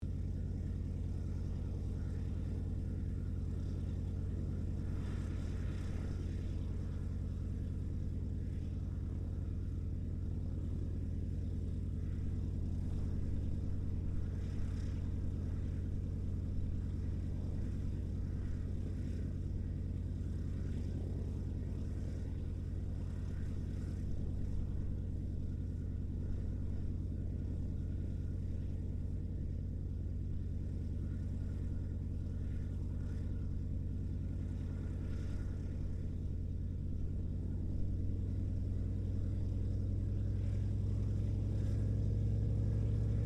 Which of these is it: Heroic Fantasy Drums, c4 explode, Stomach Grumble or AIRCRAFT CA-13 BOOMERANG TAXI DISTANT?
AIRCRAFT CA-13 BOOMERANG TAXI DISTANT